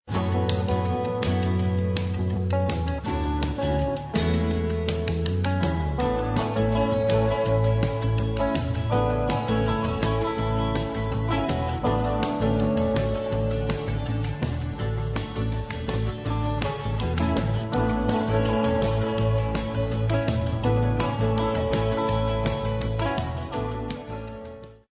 country

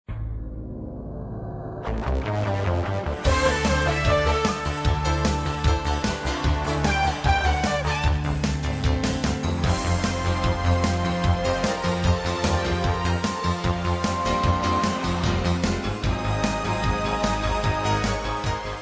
pop rock

